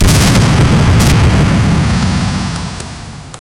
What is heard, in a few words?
war cannon fires at the